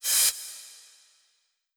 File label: cymbal reverse right to left